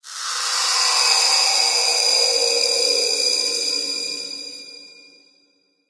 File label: amb random tech; video games; ambient; random abstract; source engine; portal2